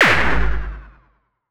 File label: Superkick Fx